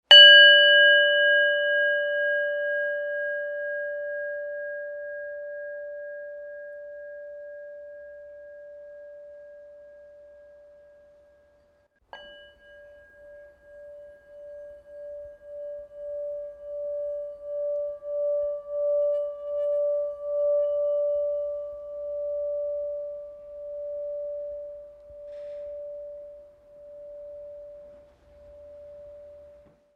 Bowl Strike -Tibet bowl strike and ringing - 2 types; Miscellaneous Impact